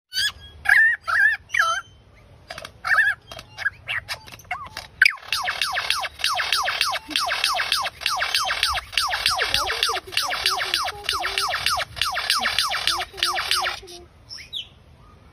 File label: Menura, پرنده